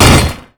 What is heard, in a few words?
attack; Dota 2; sven